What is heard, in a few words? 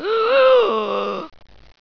mummy pain